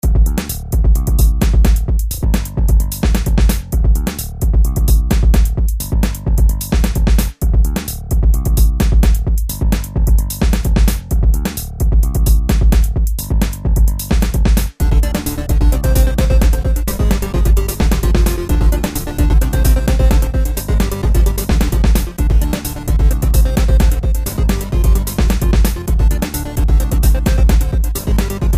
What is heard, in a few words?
Ambience Remix